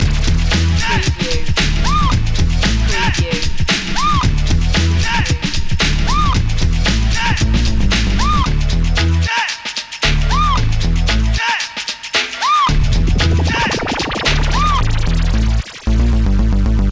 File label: hit the decks